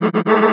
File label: vocal cuts A; Slate; AURORA Sample Pack; Vocals